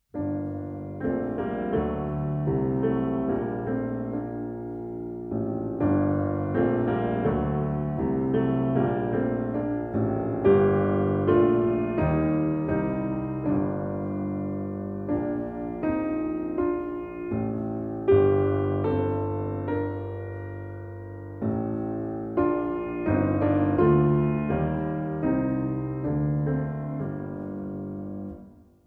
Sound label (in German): piano solo kinder, piano kinderlieder; Dornröschen